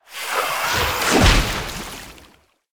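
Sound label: snake boss attack, monster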